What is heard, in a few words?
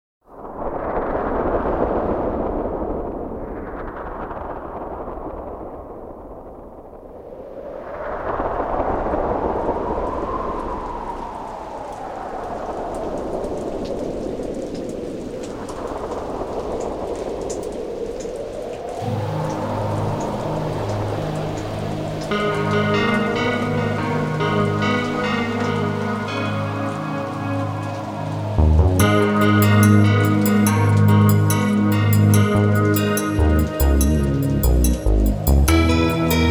Instrumental